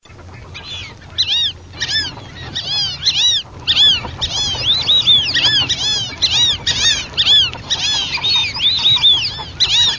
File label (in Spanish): SIRIRIRI MIX